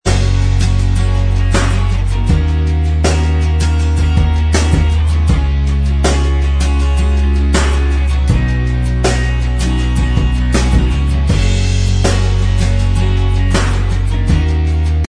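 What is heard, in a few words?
instrumental track, backing tracks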